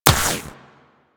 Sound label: Shield Impact